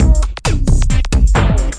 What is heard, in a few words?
133 bpm, vox breaker, drum loops and breaks